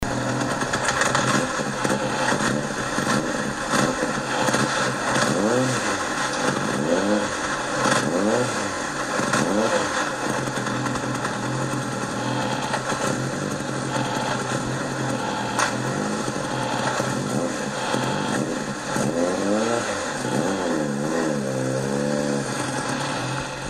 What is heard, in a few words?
Corolla WRC